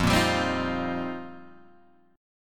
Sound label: F 7th Suspended 2nd Suspended 4th, Guitar Open G